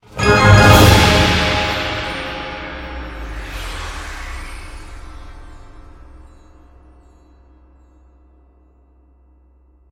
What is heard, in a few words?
super Mega Win End; fairymirror